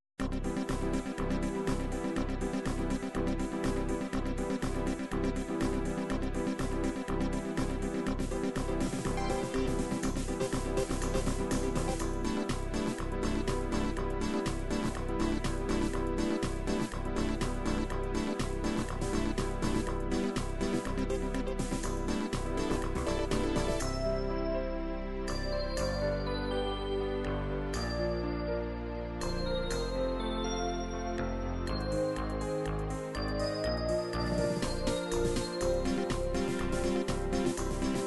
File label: dance eurobeat, midi